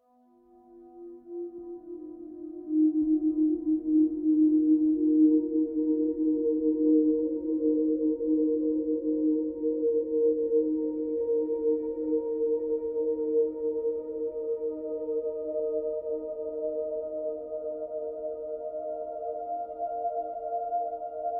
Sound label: dome music